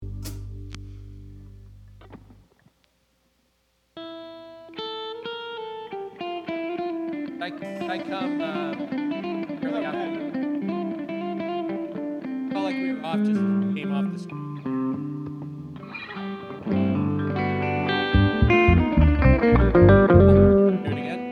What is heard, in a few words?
Strings Emphasis